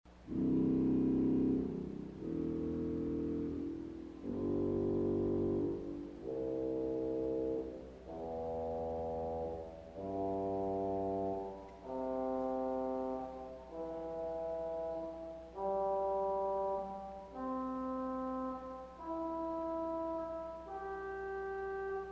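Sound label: Cor Damour 16 Arpeggio, organ stop; CulverAcademy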